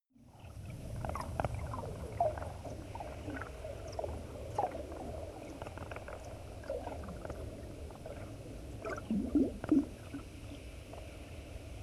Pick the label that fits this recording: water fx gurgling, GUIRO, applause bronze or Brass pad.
water fx gurgling